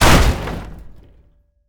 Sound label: Rotor Blade Damage; DCS